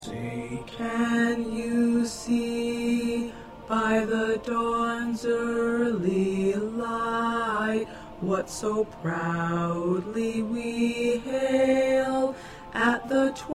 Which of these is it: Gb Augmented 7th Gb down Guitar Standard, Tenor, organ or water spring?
Tenor